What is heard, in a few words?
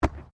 SOCCER BALL HANDLING; Soccer ball sounds; Sports